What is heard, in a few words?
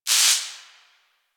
Destruction Electricity Sparks; Warframe; Corpus Railjack